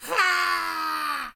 Goblin Scream; SyrinScape

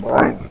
roar; The Elder Scrolls III - Morrowind; nix

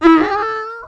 moan; The Elder Scrolls III - Morrowind

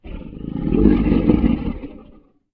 Gold Dragon Shortish; SyrinScape; Vault of Dragons

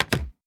stamp down; Papers Please

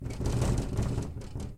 Rattling Window; SyrinScape; City gone mad

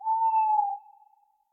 Tawny Owl; SyrinScape

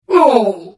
Hit; The Elder Scrolls III - Morrowind

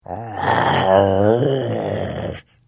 Hit, Vo

